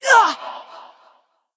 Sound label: Attack; wotlk; Creature; Possessed Vardmadra